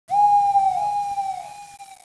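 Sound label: ambient, halloween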